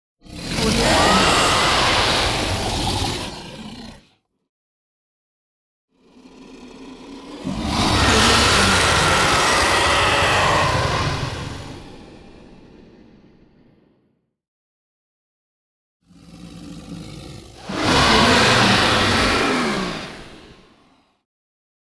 dragons

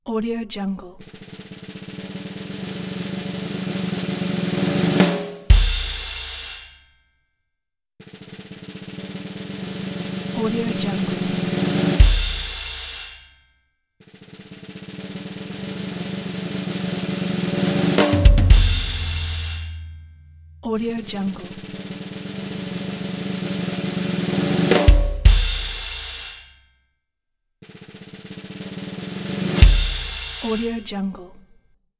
circus drum roll

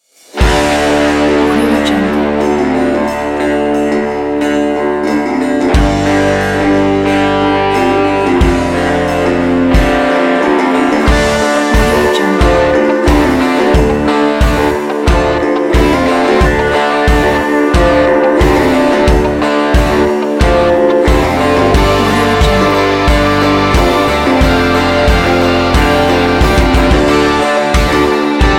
wild west rock

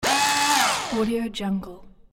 cordless drill

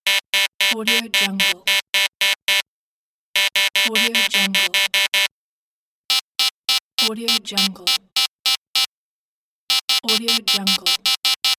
system failure loop; tsymbal

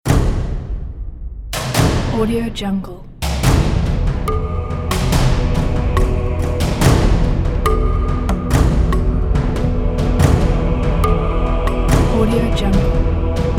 cinematic jungle percussion ident

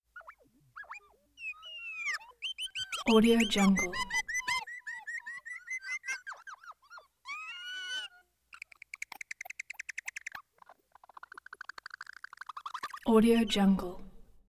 creature vocal harmonic bleeps and blurts

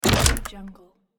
mechanical wood switch

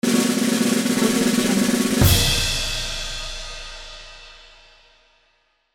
drum roll

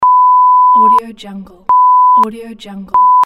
censorship beeps

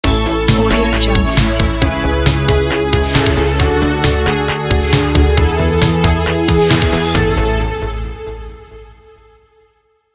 synthwave 80s logo

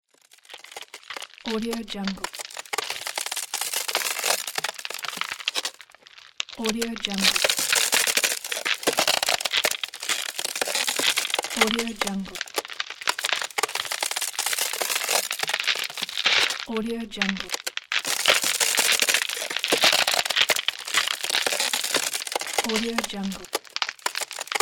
ice crackling

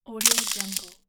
ice crystal fractures short

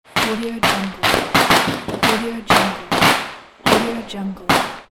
crackers

